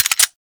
Electro Mag Mech A, Corpus weapons; Warframe

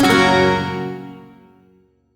Shawzin Yo Chord; Warframe; Duviri

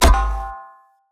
Great Sword Catch; Weapons; Swords; Dendrit Ara Great Sword

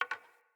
Hunter Bow Wood Rattle; Weapons; Player; Envoy; Bows

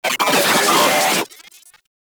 Vocal Death; Moa; Vocal Set D

